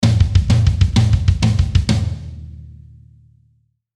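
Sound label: Musical Element, Drum Snare March; Blastwave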